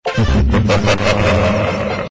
mwahahahahahhhahahahahahahahahahahahahahahahahahahahaha; bowser